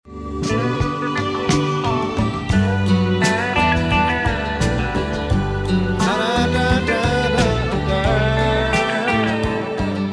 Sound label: dixieland